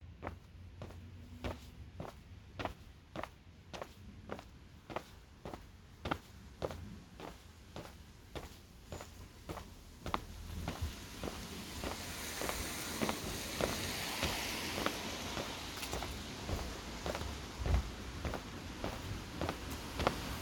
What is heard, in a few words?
Steps In The City Raining